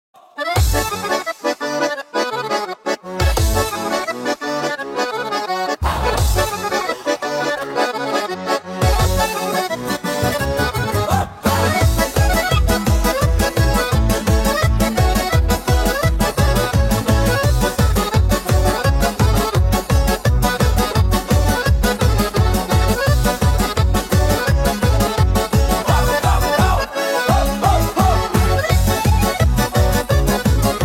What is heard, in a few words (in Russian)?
Полька